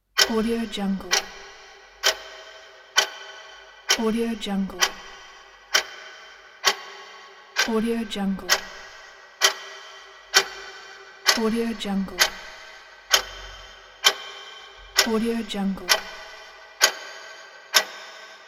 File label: clock ticking